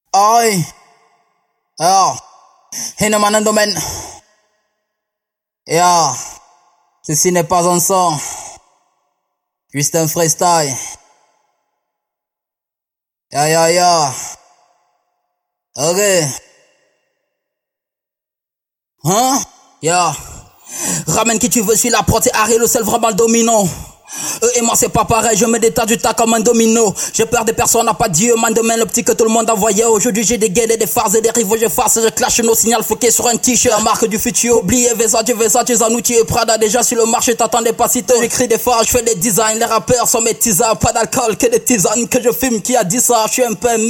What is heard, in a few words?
Acapellas trap 146 Bbm